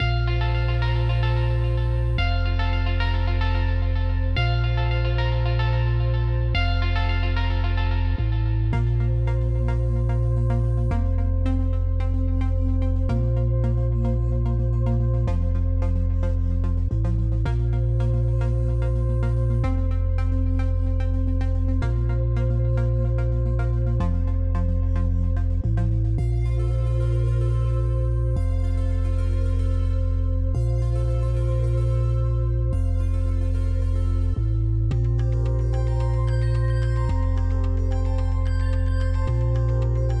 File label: Music Observation Gameplay; Smart As...; PS Vita